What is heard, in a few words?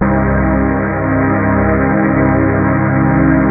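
CHOR minor; jazz jackrabbit 1; M8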